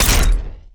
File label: Sci misc Sniper Cannon